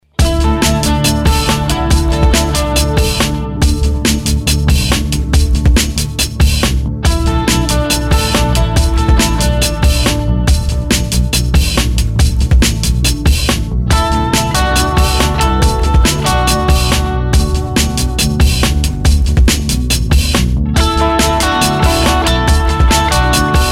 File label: korgish